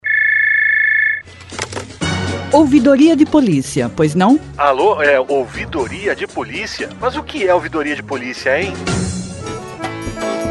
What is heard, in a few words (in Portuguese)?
Spot Forro; PERNAMBUCO